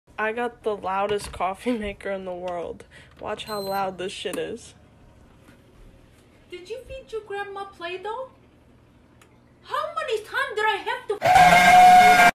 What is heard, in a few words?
loudest coffee maker